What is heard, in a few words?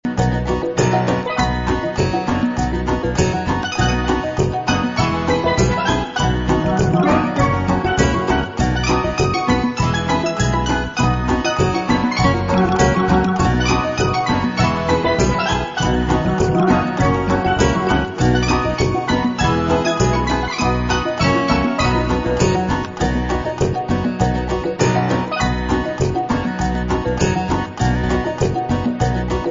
Mediterranean Salsa